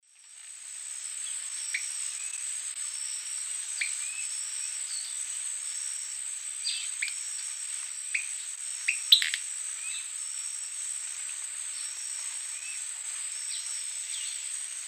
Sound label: Knipolegus orenocensis, song; S. America; Eastern Lowlands